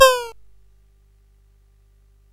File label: Paddle Mania, CSG8580R5